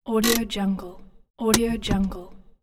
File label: hand brake